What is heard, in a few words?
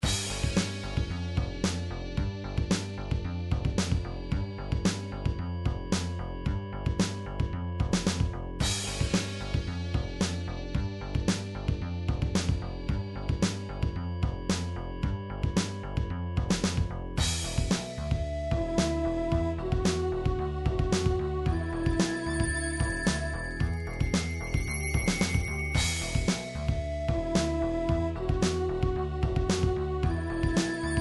background music; Gameboy Advance; Mortal Kombat 5